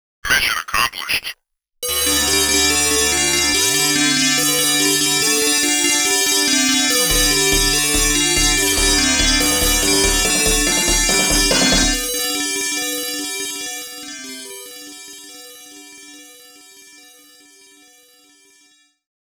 Mission Accomplished (Clear); Kuhga; Wolffang; Skullfang

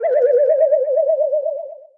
Troll Dodger Dizzy; Skylanders Cloud Patrol; Arcade, iOS, Mobile

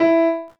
PIANO

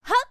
Jumping

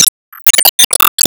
Enemy Golem Call Hand

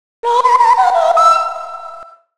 Coin; darius ii

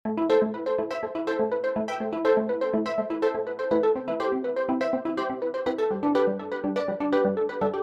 Menu Music

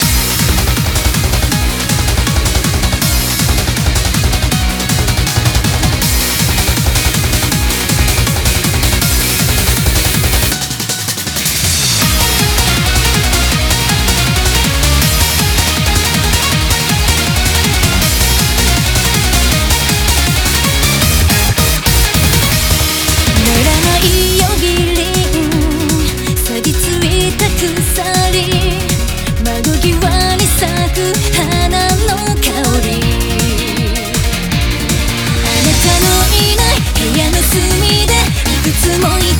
Bgm Reach

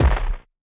Weapon Warhammer; Going Under

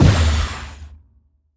Bouncy Shield Burst

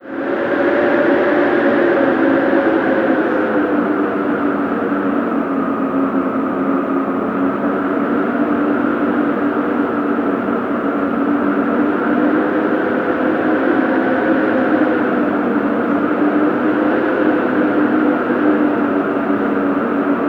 Nile river Wind howl

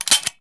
Shoot None; Wild West Shootout (Arcade) (gamerip) (2019)

